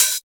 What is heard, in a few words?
raw open hat one shot d key, Royality free open high-hat sound tuned to the D note